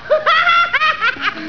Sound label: laugh